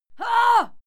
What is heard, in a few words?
female fight scream hah; schreie; menschen